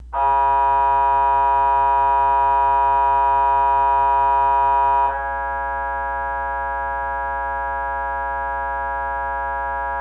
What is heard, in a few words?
Horn Continuous